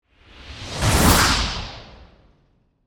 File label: Magic Spell Pass By, Horror, Magic, Spell, Pass, By, Whoosh; Blast Wave